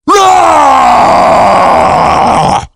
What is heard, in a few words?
rage; saxton hale